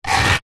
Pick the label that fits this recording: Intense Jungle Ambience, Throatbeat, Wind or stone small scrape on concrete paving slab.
stone small scrape on concrete paving slab